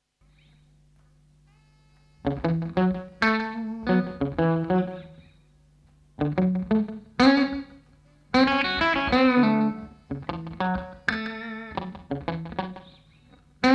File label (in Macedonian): Ehoreverb gitara; EMITER